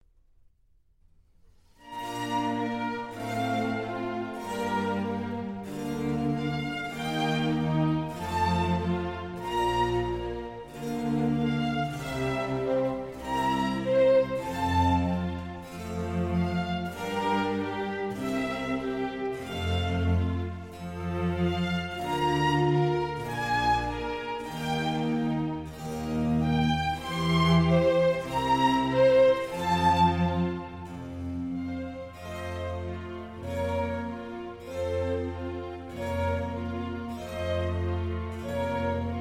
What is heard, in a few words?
Concerto for Strings and Continuo in B flat major, Andante (molto); RV 163; Conca; Vivaldi